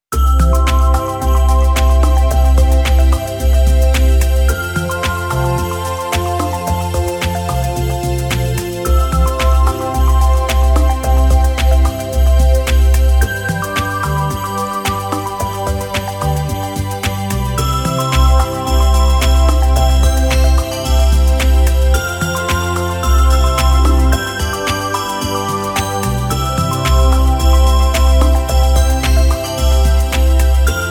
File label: jingle bells fast version instrumental